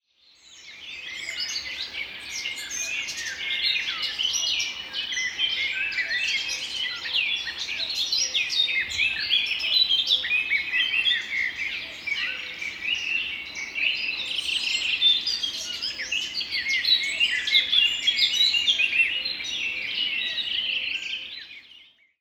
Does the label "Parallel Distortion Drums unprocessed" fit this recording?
No